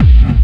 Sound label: Hard Kick